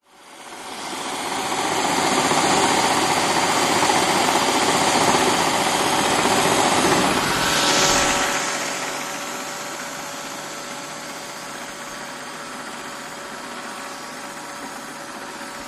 vacuum robot vacuum close by then further away carpet; zapsplat